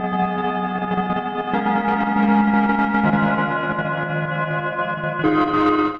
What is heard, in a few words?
Chords High G# Minor 160 bpm; MIDI Clips; Ableton; Factory Packs; Skitter and Step